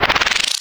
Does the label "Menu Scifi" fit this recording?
Yes